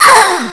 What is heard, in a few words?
death; alienarena; xeno